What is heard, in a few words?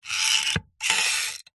industrial metal lever pump squeaky creaky single slow action